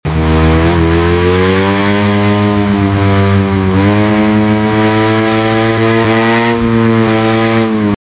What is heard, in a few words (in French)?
bruit moto; table ronde nuisances sonores troubles du voisinage